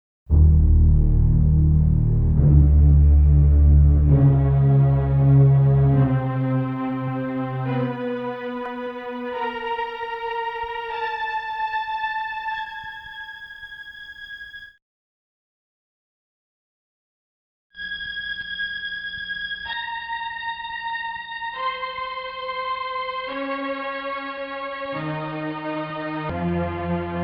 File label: Risset-like Tones